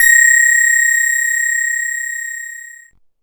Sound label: BELL-GONG, A#; Big Fish Audio